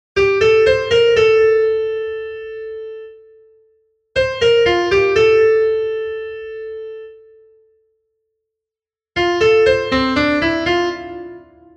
Em ファイブワン

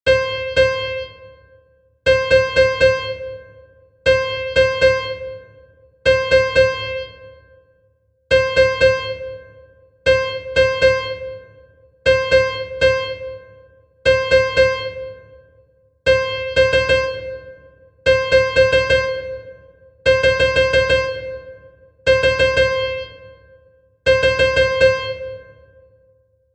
リズムパターン

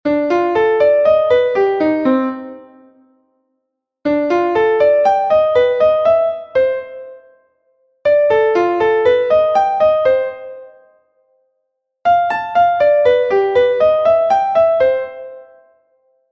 オーギュメント を 使った ツーファイブ

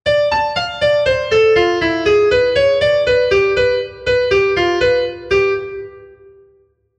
テンションノート を 用いた フレーズ

